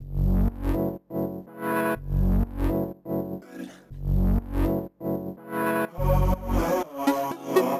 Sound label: Bunker F# 123 FX; RoBKTA Sample Pack Supreme